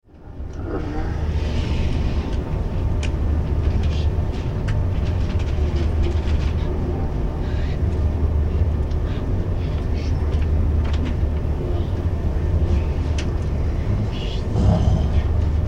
Aircraft airbus 319 or 320 interior stationary passengers boarding; Airplane Sound Effects